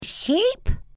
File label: SHEEP